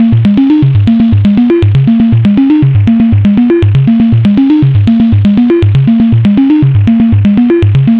bloop 240

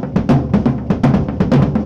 tribal loop 120

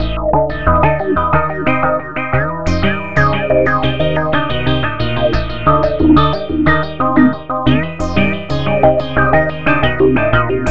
acid wall 90

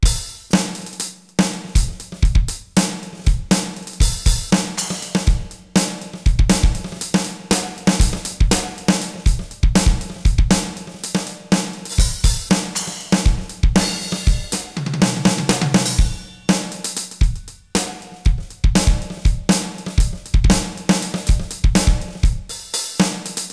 high rock sync 120

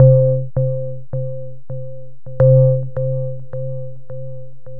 Bells 100

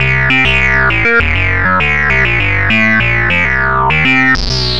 acid 100; synth loops

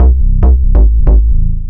acid 140 loop bassline

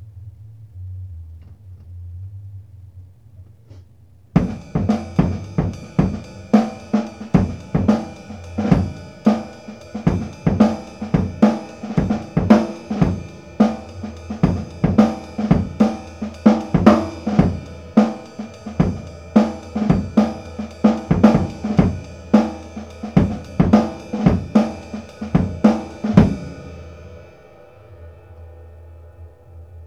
beat sync funk ride 110, acoustic

